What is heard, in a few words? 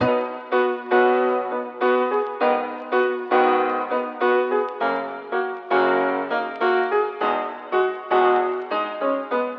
melody B maj 100 bpm (swung); bespoke - Floral v.1